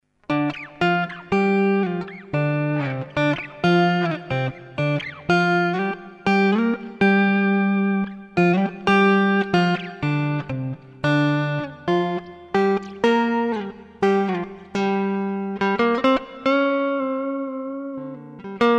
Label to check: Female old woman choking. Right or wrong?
Wrong